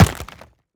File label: creature wood attack impact; video game; Bluezone Corporation